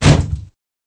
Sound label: STRAW